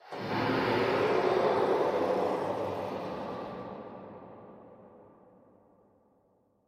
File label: alert